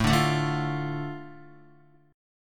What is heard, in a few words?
A Suspended 4th Sharp 5th A Guitar; Asus4#5 strummed; Asus4#5 chord; JGuitar